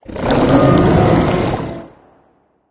lobby victory growl; smite; poseidon

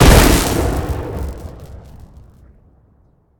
deployable explode; smite; raijin

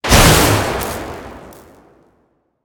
hit; smite; god raijin

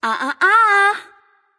disagree light; smite; voicepack; hel

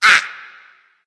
grunt hit; smite; ratatoskr

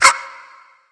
grunt jump; smite; ratatoskr

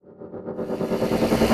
buildup; smite; scylla

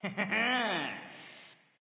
lobby defeat minion; smite; poseidon

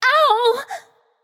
ouch light; smite; voicepack; hel

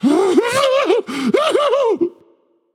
fear; chronos

